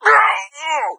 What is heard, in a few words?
death, hazmat zombie; doom3